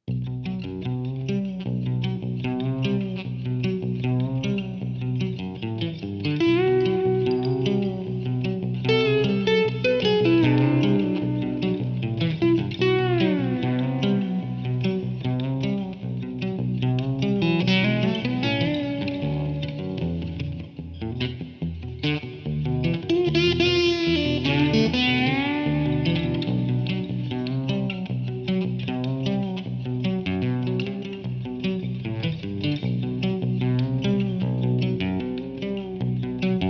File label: fretless nylon